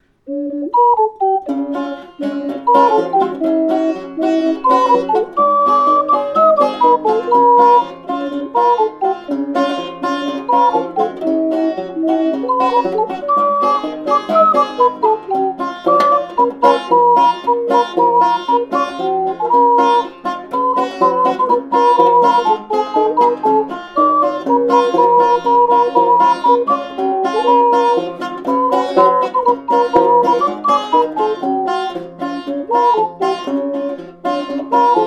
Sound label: Jingle Bells